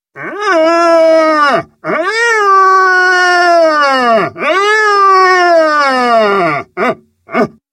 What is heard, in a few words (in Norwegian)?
Yngre hjortebukk